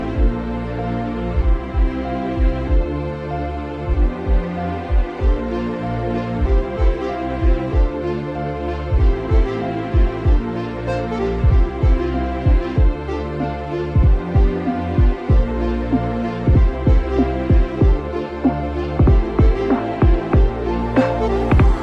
Huawei P9 Lite Ringtone

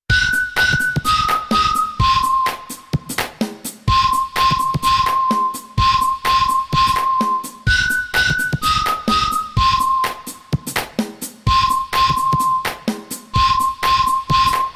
Htc Ringtone; Htc Dahlia

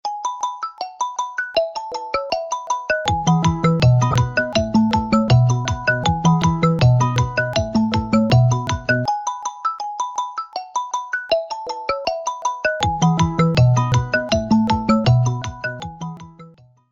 Xiaomi Black Shark 5 Pro Ringtone

